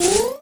slide

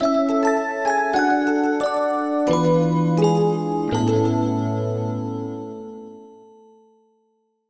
Bgm mission fail

